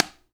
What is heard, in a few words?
Kicks